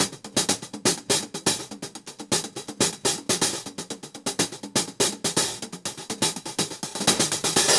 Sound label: hat live loop 123